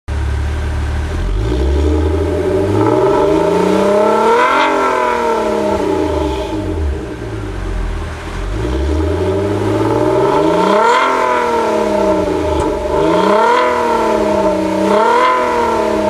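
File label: GT type 2 V6, Alfa Romeo; unicorse-italial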